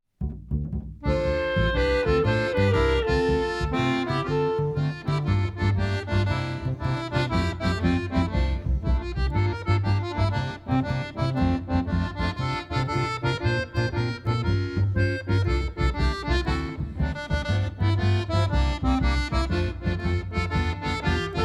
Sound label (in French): playbacks; barouf; barouf au musee